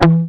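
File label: GUI Pick Damp, guitar; Best Service Gigapack 2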